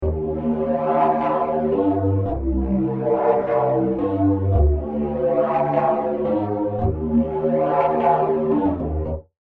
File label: serum synths; chiqui audio